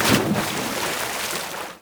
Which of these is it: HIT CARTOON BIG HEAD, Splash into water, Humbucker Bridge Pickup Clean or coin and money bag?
Splash into water